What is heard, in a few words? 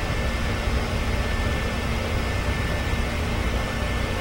access tube, ambiances; CosmicRage